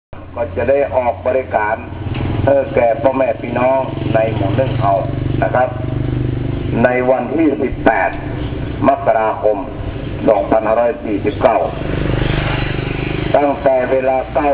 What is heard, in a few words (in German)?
lautsprecher, ein Lautsprecher an der Strasse; ein Lautsprecher an der Strasse zum Hundebiss; Chiang Mai; thai cambodia